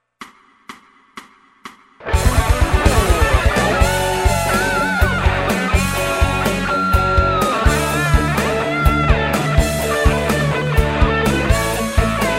metal

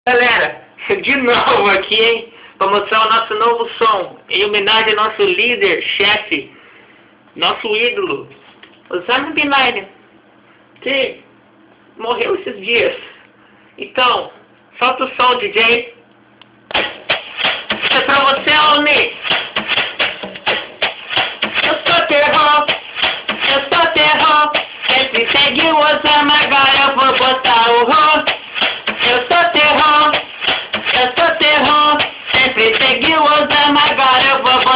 funk; do osama

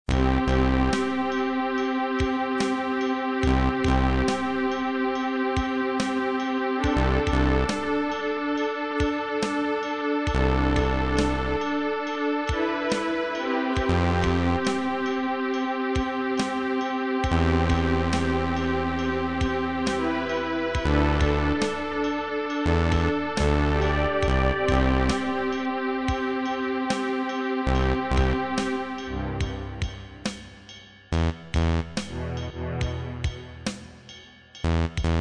square crash

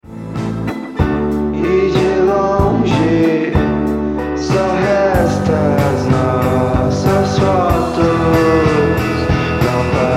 indie rock

